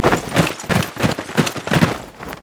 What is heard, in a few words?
emote laugh; LoL; twitch